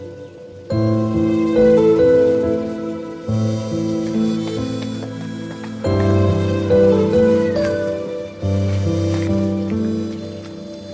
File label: Rington Turkey; Sad